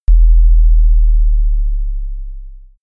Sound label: sub effect